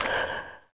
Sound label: zombie ghost footstep; ghostfury